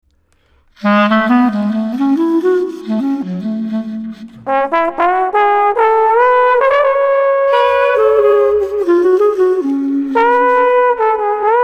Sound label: Street Clarinet Trombone Dreamy 70